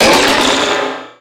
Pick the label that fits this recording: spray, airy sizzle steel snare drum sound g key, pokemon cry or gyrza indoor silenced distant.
pokemon cry